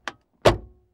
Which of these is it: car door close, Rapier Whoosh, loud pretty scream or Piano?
car door close